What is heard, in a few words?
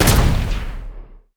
sfx skill, ZombieSkill SFX; IdleRPG2024